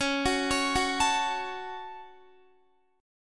C sharp Suspended 4th Sharp 5th C sharp down Guitar; arpeggiated